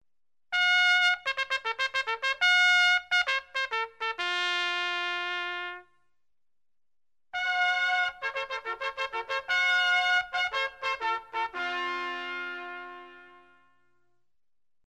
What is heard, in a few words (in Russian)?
truba signal k nachalu korridi